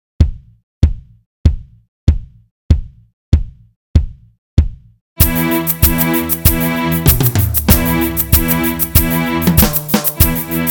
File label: String Rock